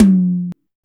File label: TOM Tom; Best Service Gigapack 2